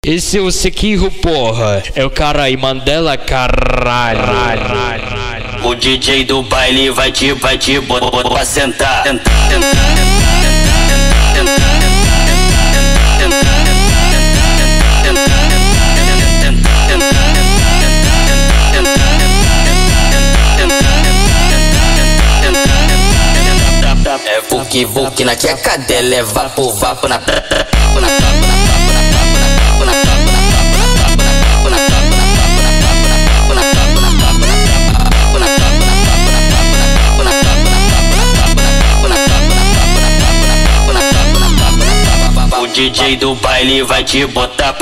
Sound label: Brazilian funk